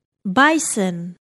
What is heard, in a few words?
bison